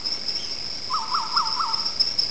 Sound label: jungle